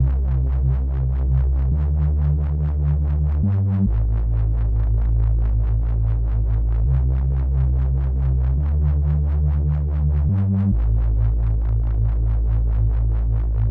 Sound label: lfo filter fast